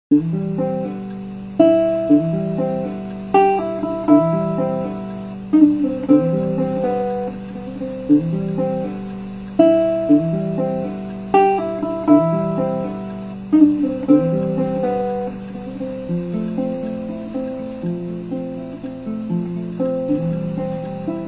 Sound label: strum